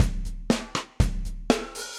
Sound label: Kit Reso Classic, Drums; Standard Kits; Ableton; Factory Packs; Session Drums Club